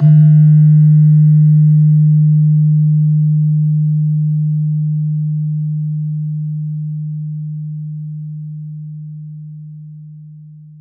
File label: PELOG D1, PELOG BASS; Soundscan vol.60; AKAI